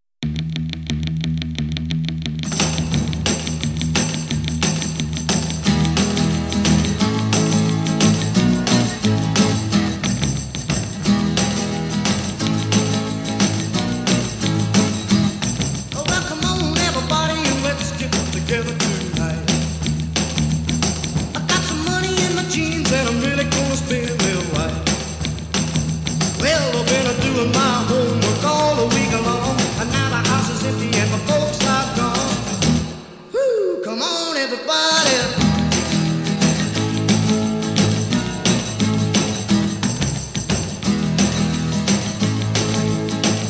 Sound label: rocknroll